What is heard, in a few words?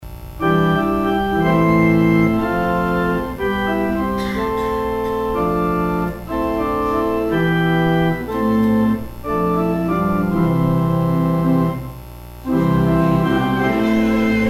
hymn; Child in the manger; carols